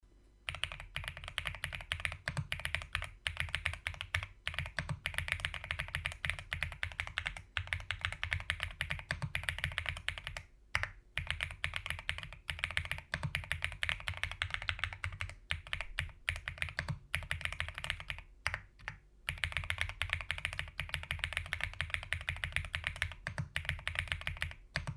epomaker p75

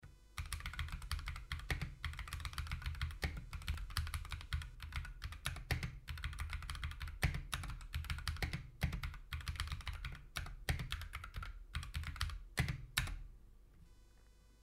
akko 3068b plus

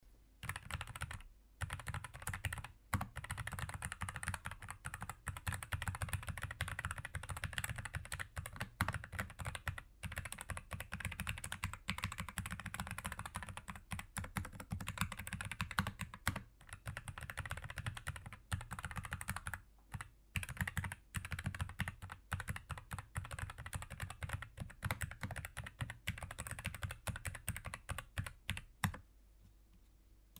carbon60

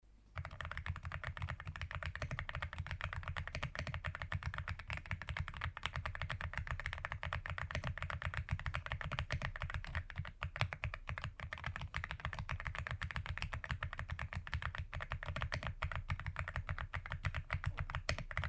epo aula

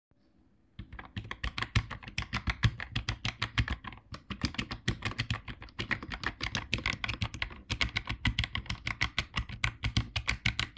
epomaker luma40

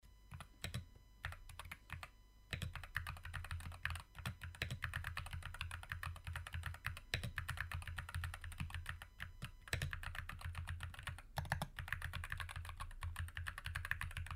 muse65

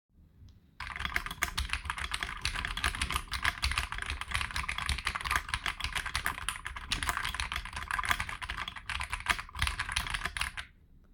epomaker tide 75

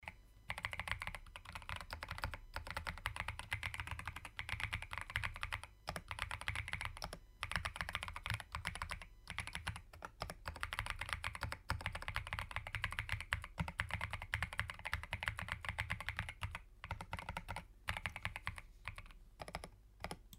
epo th40

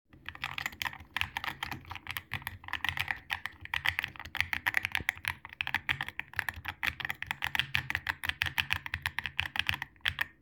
io type 68 magnetic

